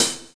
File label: Breath HiHat; Sonic Foundry (Sony Creative Software); Drums & Drones; Construction Kits